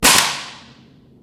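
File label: physics clipboard impact large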